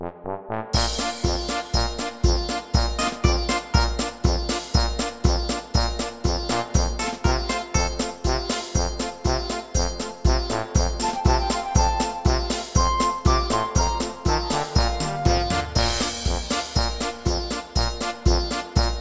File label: Karaoke